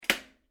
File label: Household Washing Machine Button Push; Cleaning (Sound Effects:Household)